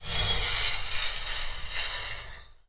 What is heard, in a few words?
foley metal scrape shrill